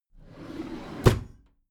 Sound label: packed drawer smooth open regular